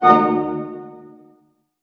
harp hit A MIN; Sound On Sound - Peter Siedleczek's Orchestra

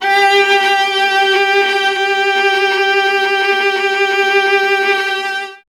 viola G; Miroslav Vitous; Solo Instruments VOL-1

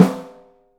OHSTSN L, 3D Drums

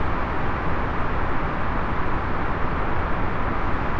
InfiniVerb Noise, sparse soundscape Loops

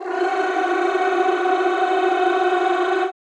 CHO Rrrr F#3, Roland; Sound & Vision; Gigapack I CD 2; CHO Mmhh + Rrrr

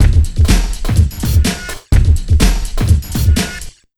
BIG 125, Big Beat Drumloops; USB Soundscan vol.25; AKAI

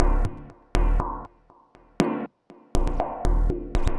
Mod Loop, Modular Percussion

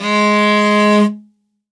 R, morin khuur, World Instruments; Best Service ProSamples vol.52 - World Instruments 2; AKAI

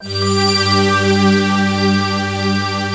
SPACY, HOUSE

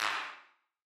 Wider Clap; stereo toolkit samples

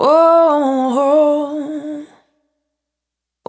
Urban, Single Voice Melodic Loops, Vocal Samples

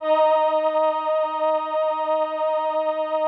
VP-330, String Machines

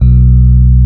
B 0, Electric Pianos; Wizoo

